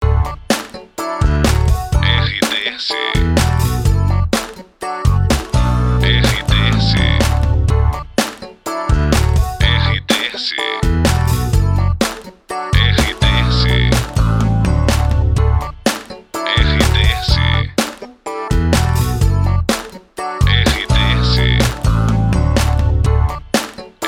trilha para locução; Prata FM 2021

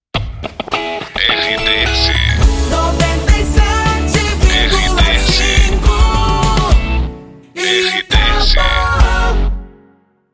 PASSAGEM; Itapoan

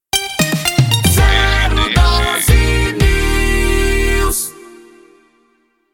PONTE NEWS

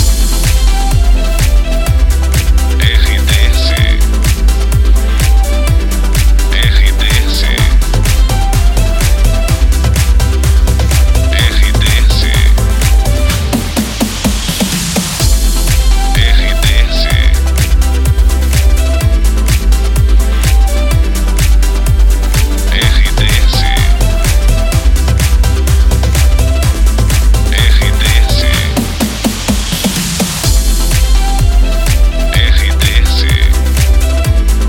trilha para locução balada trilha; Itapoan FM

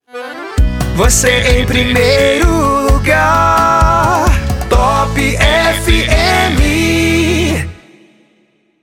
passagem; Pacote Top FM, Sorocaba/SP. Emissora com característica 100% Sertaneja

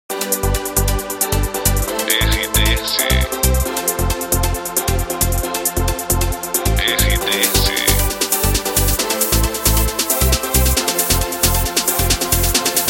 trilha para locução; Pacote Maximus FM de Rio Paranaíba/MG. Emissora com perfil Jovem

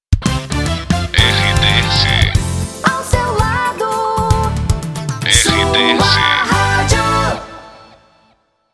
passagem, vinhetas cantadas; Sua Rádio; Pacote Web Net

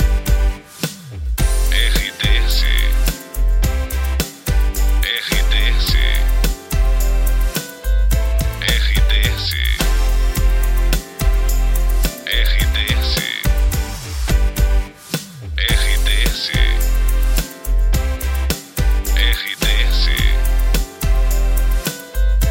trilha para locução; Rádio Panorâmica FM de Campina Grande, Paraíba, Brasil

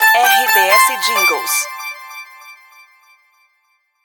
sinal de hora educativa; Pacote Difusora FM, Nortelândia/MT

